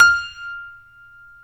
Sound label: StereoGrand, Keyboards